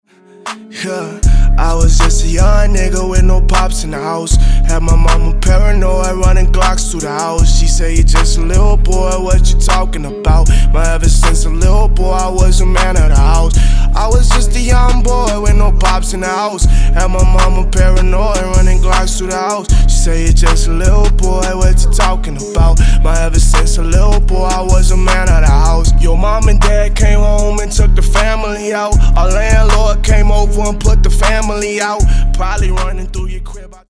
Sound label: HIP HOP